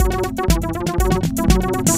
Electro; Monument Park C

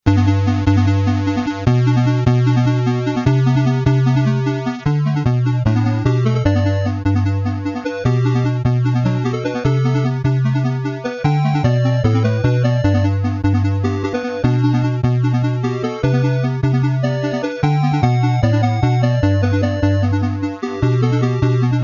Level Theme; Gates of Zendocon

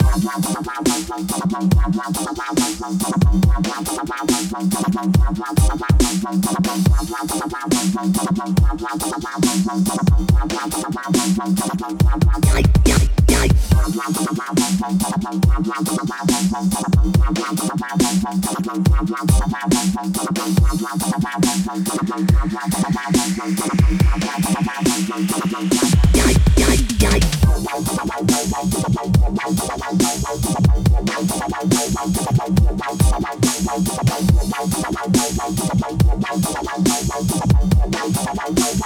Dubstep Intense; Bogheim

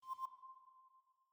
Move D7 Mp

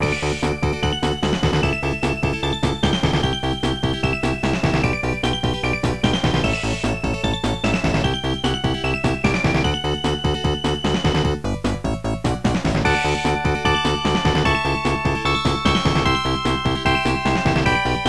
Menu (Arcade); tetris plus

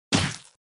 Sfx Hero Hit; Mighty Knight 2

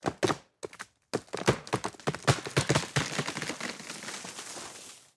foliage squeak

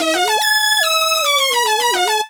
Beat

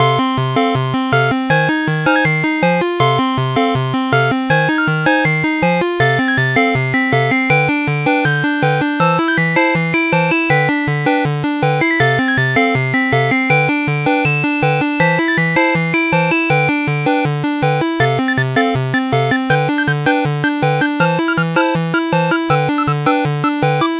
Vs Options Music; Bebop and Tempo

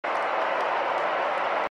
Shum; Troll Face Quest Unlucky; Android

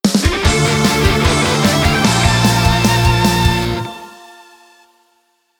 Stage Clear; met megaman x revision

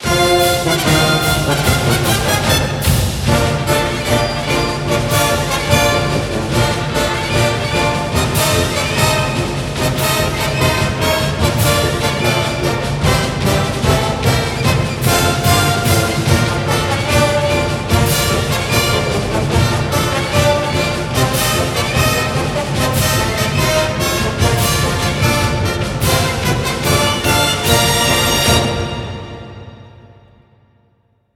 Broadcast Outro

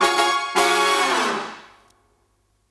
Victim Reveal

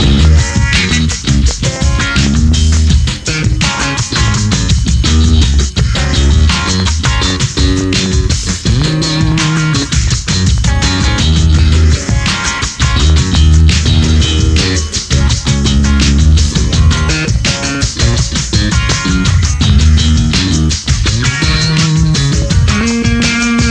Instrumental; ESPN NBA 2Night; ps2 gamerip 2000

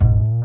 Bass Missed note; The Princess and the Frog; wii windows; Hub Bayou; Music Riverboat

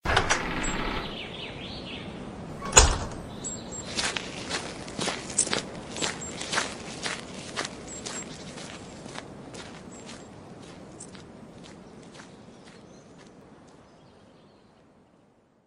End Sound; Slendrina: Asylum; Android

